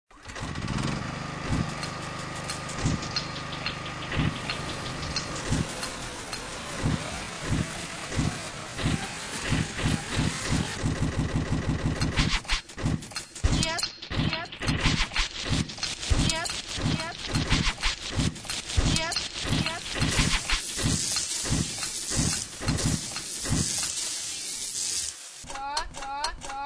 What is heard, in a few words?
techno